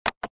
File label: btn Click; 1casino-supreme-hot-anim